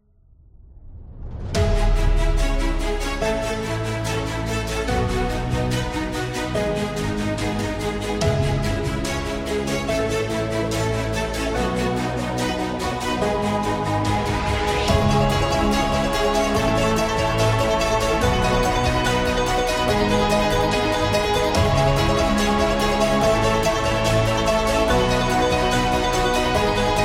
Epic Electronic; documentary

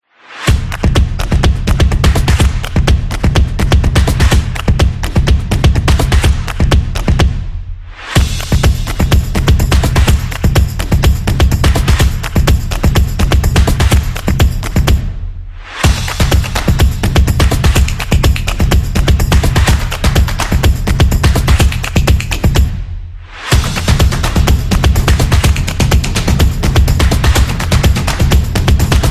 Drum Stomp

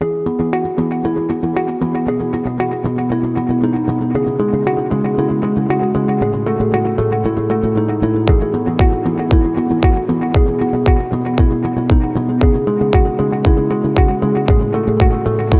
Ambient

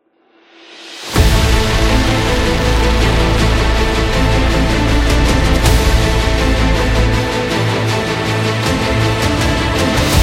Epic Action Trailer

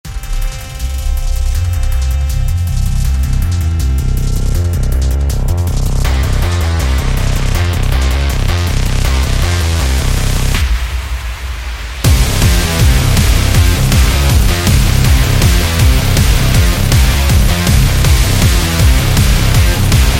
Dubstep Extreme; sports training energy

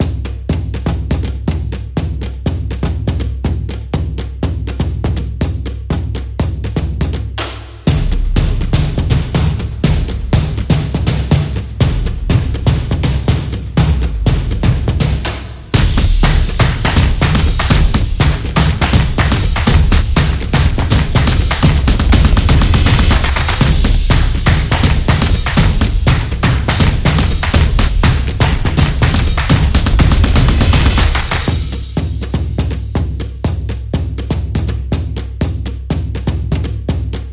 Tribal Drums

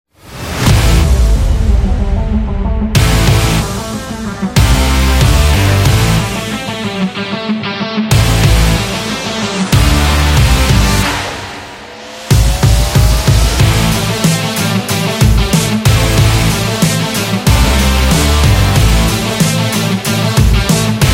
Action Rock Sport, metal

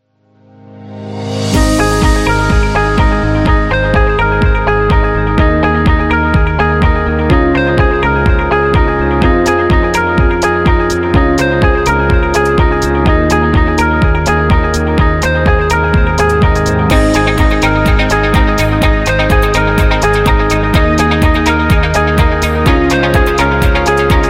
Corporate Slideshow

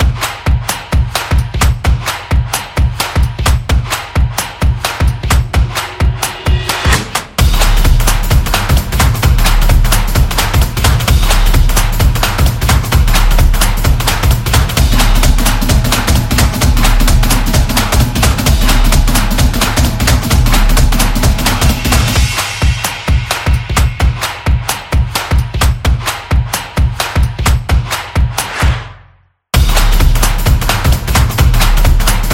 A Drum

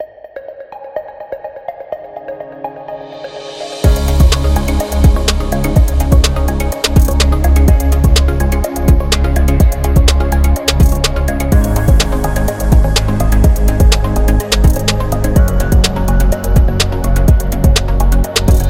Tech Corporate; friendly

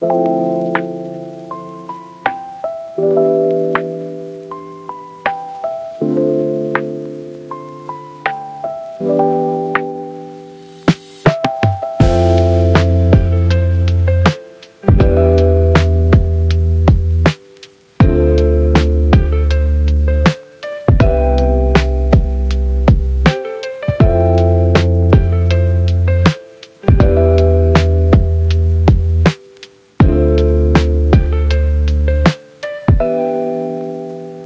Lofi

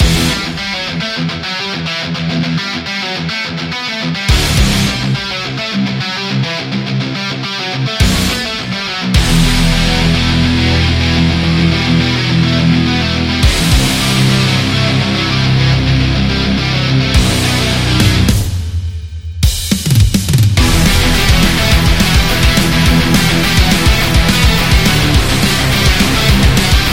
Heavy Metal, danger

